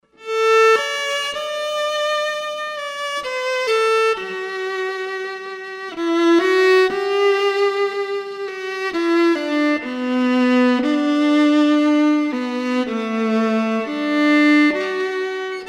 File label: acoustic; barbera v35